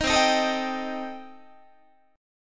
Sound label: C Minor 6th Guitar, chord; Cm6 strummed; JGuitar